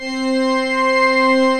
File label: ORGAN & BRASS